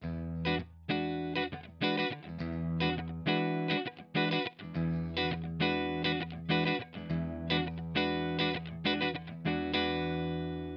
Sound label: Elixir clean